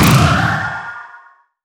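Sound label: Bomb; Pac-Man Championship Edition 2 Plus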